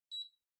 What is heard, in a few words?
Air Purifier Far Beep